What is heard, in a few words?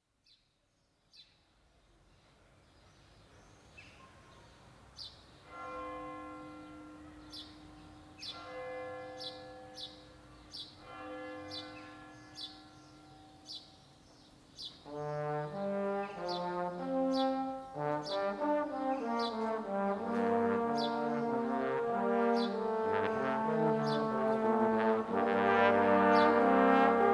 Baroque Samba